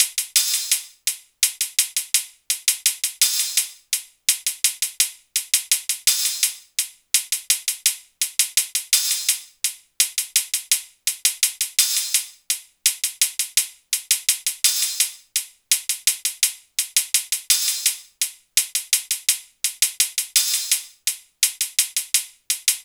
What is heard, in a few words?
hat 84, Hat Loops; Drum Loops; SD MIDNIGHT Sample Pack; Slate